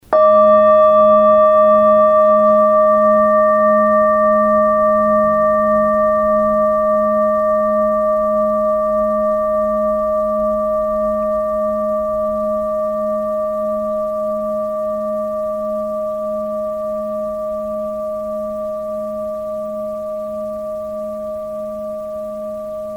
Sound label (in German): klangschale; 700g; boen